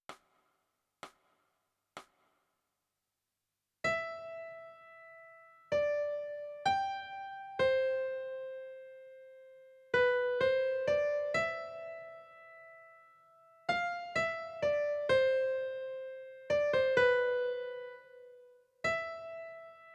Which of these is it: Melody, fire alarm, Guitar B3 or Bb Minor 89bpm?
Melody